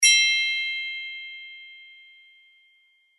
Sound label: fairy wand